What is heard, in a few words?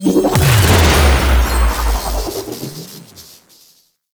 Portal Spawn; Warframe; Dark Sector